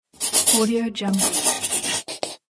pencil writing; mike-88

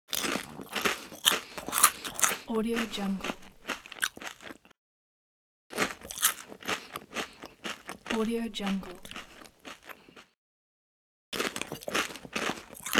eating hips

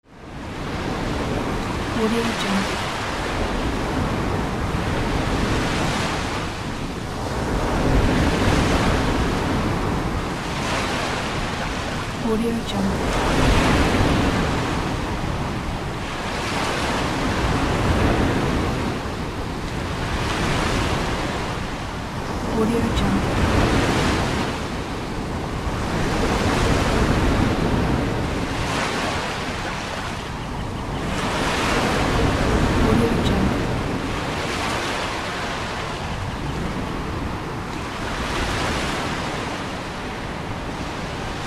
ocean waves

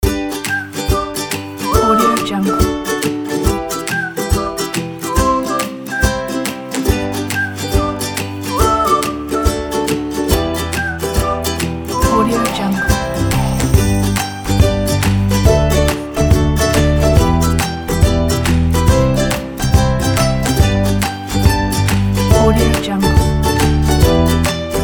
positive happy

